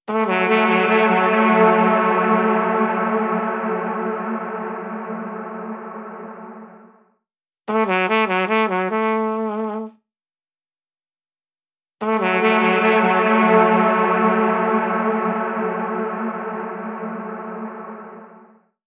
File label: Trumpet Trails Binaural; Flashback; Blackhole Immersive